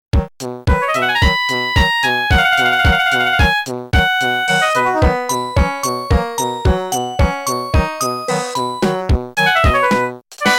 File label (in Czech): melodie